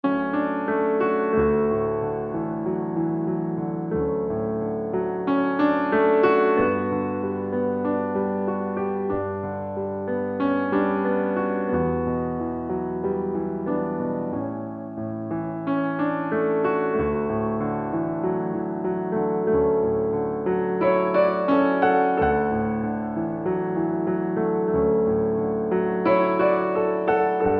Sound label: Light Piano